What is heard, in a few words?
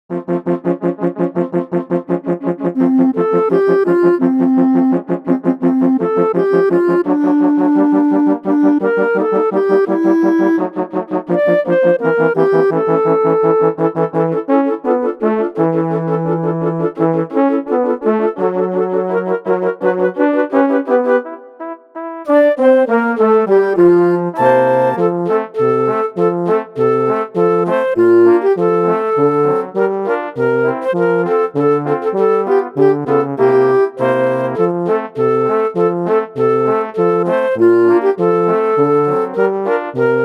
Jingle bells